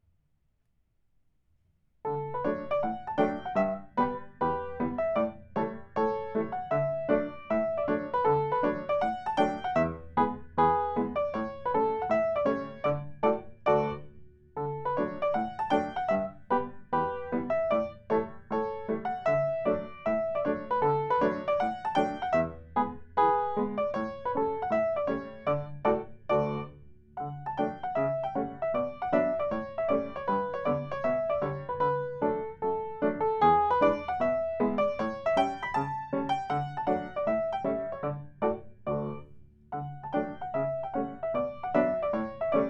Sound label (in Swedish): schottis; riddarhyttan